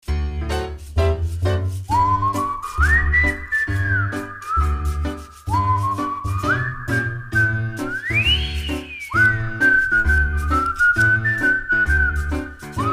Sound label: theme happy whistler, medium